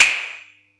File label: Yamaha EX-5